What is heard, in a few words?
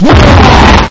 bwaaaaaaa